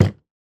household bottle of mouthwash place down on hard surface